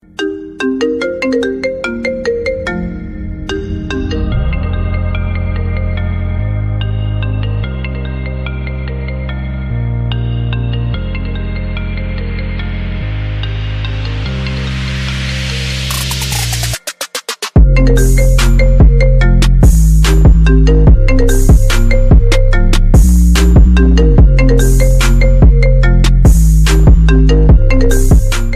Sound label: iphone ringtone